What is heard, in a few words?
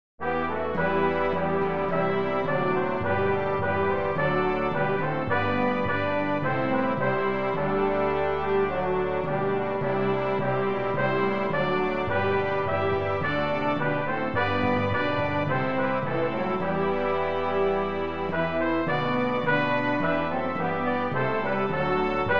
Hymn